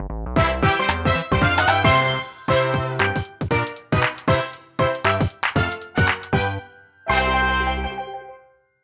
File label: BGM Jingle Course Clear; mario wonder